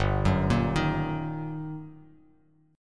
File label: G Minor Major 7th Sharp 5th, down, Bass Standard; GmM7#5 arpeggiated; JGuitar